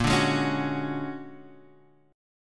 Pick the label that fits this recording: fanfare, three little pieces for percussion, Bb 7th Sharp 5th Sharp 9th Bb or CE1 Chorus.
Bb 7th Sharp 5th Sharp 9th Bb